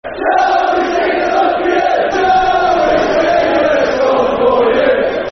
Shall We Sing Song For You, Football Chant (Soccer Songs); Crewe Alexandra Cafc